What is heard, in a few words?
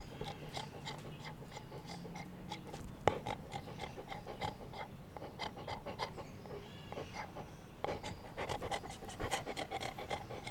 Dog Breathing